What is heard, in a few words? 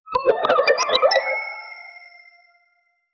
playful mischievous magic, whimsical